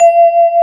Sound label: VIBRA C#3